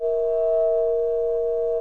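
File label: A sharp 4 with E5, flute multiphonics; modern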